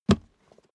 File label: step barefoot metal single trample right